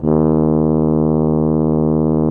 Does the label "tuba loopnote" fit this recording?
Yes